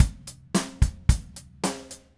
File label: Red Maple Groove 110, DW Red Maple Kit